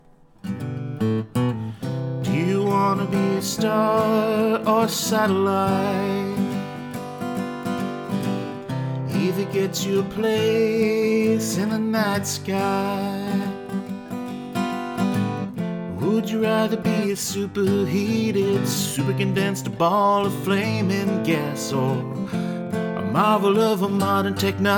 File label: fast music; satellite